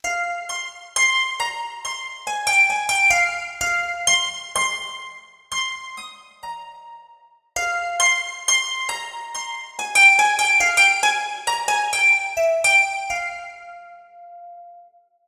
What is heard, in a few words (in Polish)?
Cytra